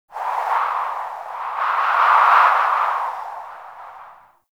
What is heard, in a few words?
wind gust; Warframe; Eidolon Plains; Ambience